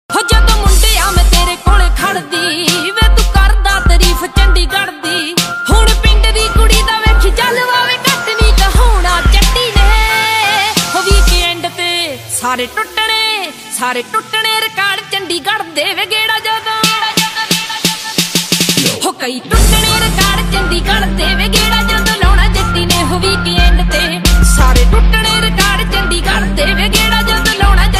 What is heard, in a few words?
punjabi ringtone